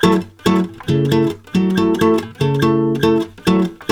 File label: F# MIN, Brazil Bossa; USB Soundscan; AKAI